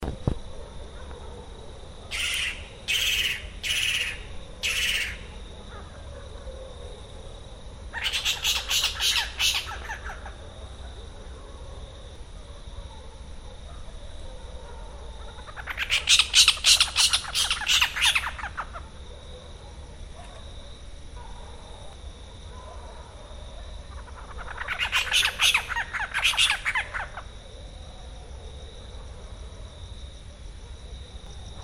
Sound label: Spotted Owlet Athene brama